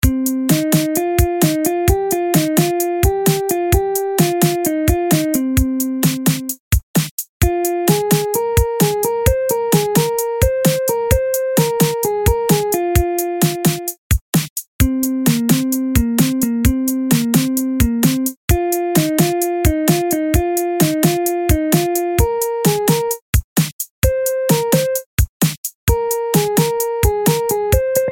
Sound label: Ringtone